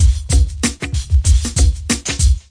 tricky squashed hiphop, drum loops and breaks, 095 bpm; 091 to 100 bpm